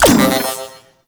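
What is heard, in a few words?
Drone Projectile Land; Warframe; Corpus; Corpus Lich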